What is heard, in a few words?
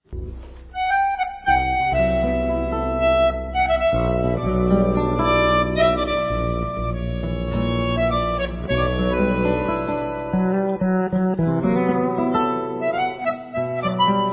Jazz meets Tango